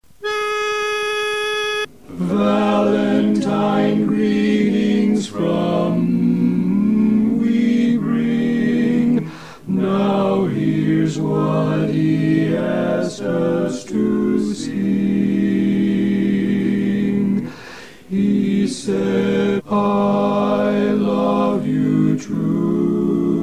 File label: BARI Greetings+, barbershop quartet